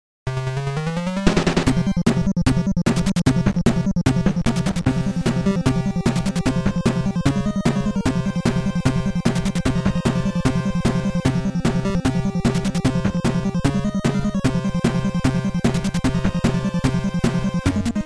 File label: Final Battle; just breed nes